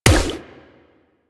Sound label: Water Sprayer Punch Layer; Warframe